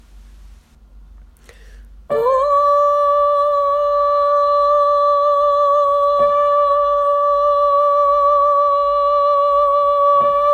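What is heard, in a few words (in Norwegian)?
var gud skal ha æren sopran; åfjord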